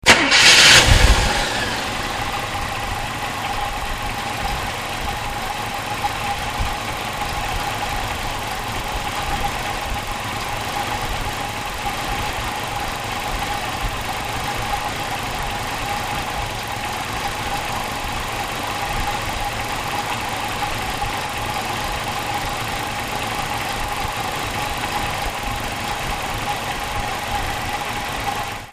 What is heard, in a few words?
chevrolet impala ss